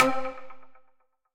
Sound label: Toasty Pluck; Guitar & Plucked; Ableton; Glitch and Wash